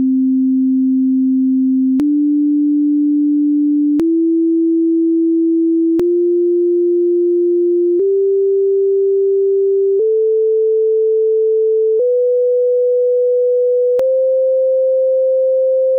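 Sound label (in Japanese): 平均律音階, Cdurで音階, 波形はサイン波; 平均律と純正律; 純正律と平均律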